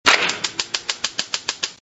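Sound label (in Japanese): tenka, ignition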